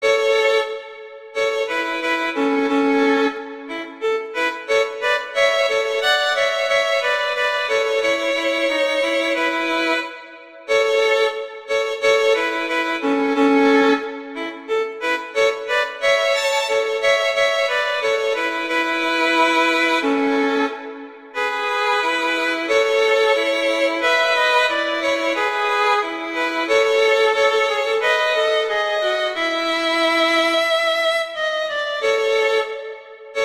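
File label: Duet, horn; Mozart Duets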